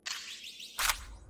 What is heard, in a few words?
switch activation; HLD